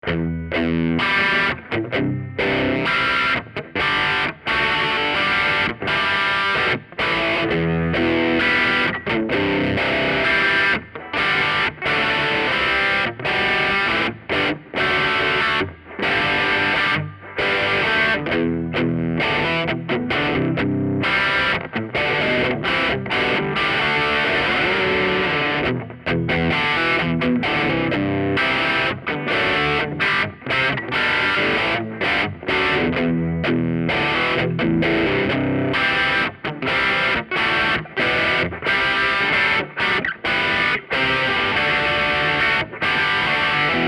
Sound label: Em Rock; PRS 513